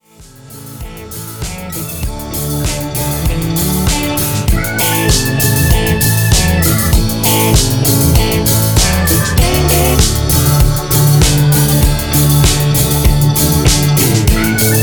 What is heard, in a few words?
Disco Blues in A